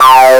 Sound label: Synths Root C